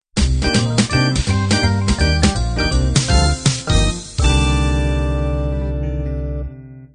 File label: b3 jazz end